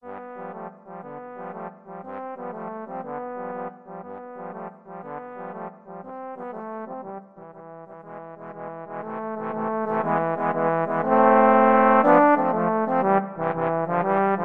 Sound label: trombone trio